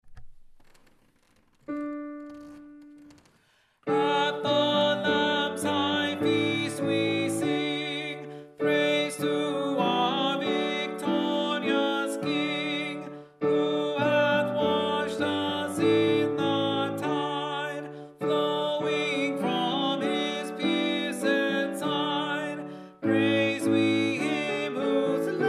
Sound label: Alto; At the Lamb’s High Feast; Selected Gregorian Chant & Hymnody